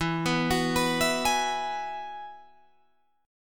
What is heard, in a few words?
E Major Add 9th, Guitar Drop D